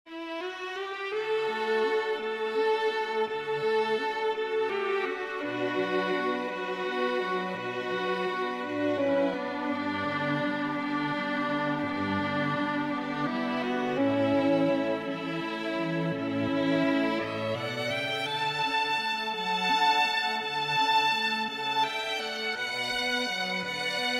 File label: String Quartet